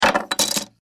Wood Debris Foley Small Pieces Falling On Wood Floor; Materials; BBFX